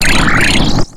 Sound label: klang; cries